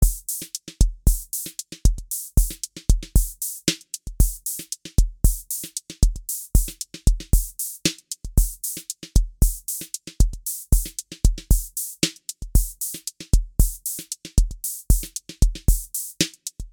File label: drum